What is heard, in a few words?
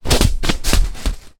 inflatable rubber ring throw bounce on hard ground